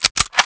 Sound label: pistol out; Pirates Of The Caribbean; DUEL